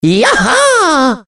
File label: yahaaaaa